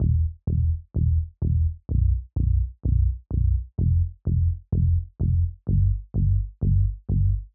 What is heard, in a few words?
bass